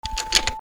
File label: key